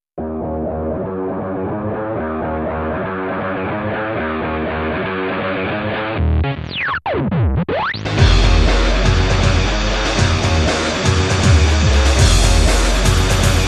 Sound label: noise mix